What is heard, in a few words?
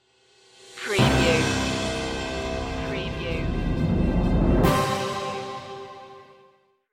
stinger